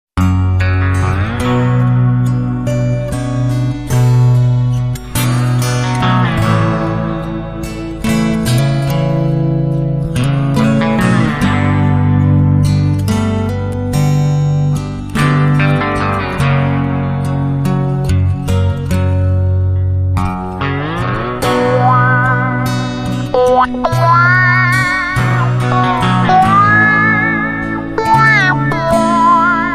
Ballad Wha

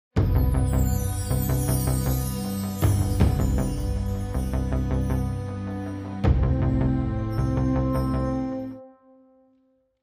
Heroic, Sting

